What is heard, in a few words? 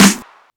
snare one shot g key